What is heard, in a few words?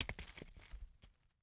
Softball Hitting Earth; Sports